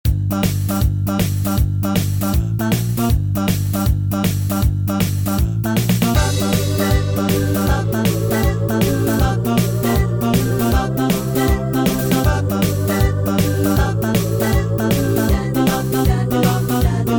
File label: game over